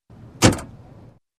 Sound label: Old rusty car door close